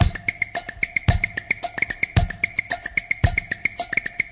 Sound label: 111, drum loops; 111 to 120 bpm